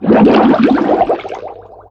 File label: bubbles